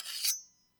machete vs spatula, Raw recordings of a spatula striking against a machete.